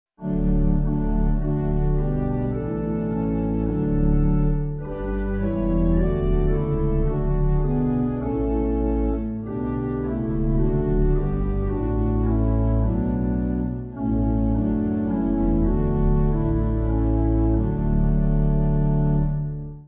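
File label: Pipe Organ; Lord Your Word; Ravenshaw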